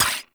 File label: Pistol Mech; Operators; Drifter